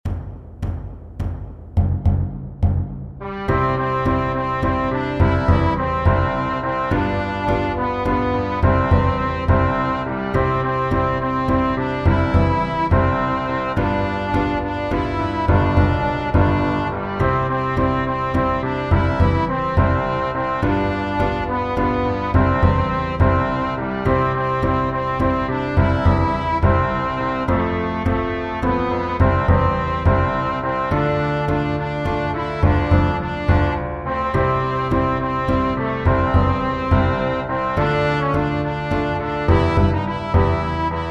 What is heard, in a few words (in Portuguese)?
piano